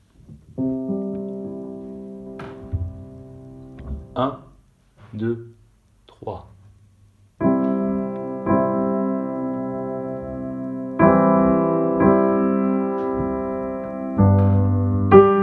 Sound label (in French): piano seul